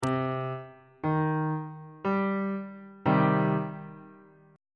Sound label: c minor chord on bass clef